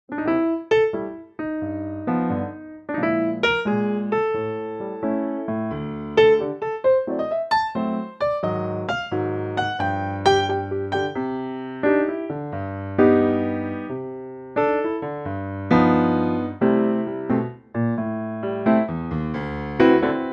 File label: C Piano; vocal range - C to D